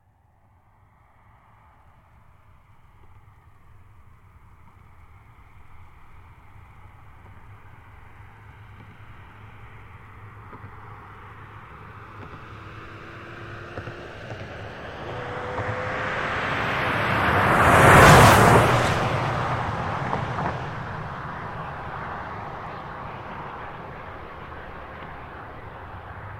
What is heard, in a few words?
Car Highlander By Very Fast; 2005 Toyota Highlander V6; Cars; Vehicles; Big Room Sound